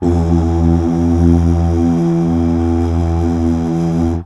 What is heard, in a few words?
UUH